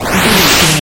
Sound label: retro spaceship jet boost